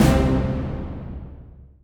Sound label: Combo Even Hit; Bee Movie Game; Duel